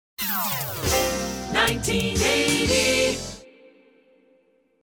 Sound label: Hits, Drops